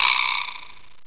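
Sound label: vibraslap; gmplay; drums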